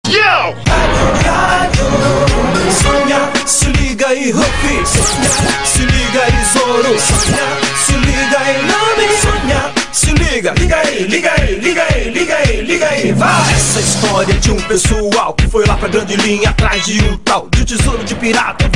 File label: Rap; One Piece; OP, primeira dublagem